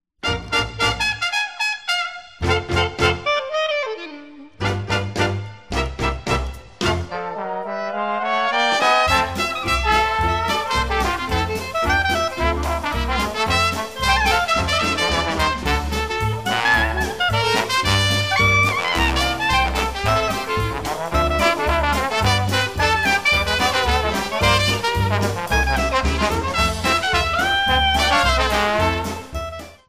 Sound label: dixieland band